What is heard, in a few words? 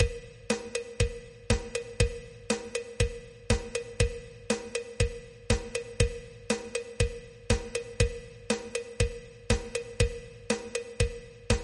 cumbia, rhythm; Creating Rhythms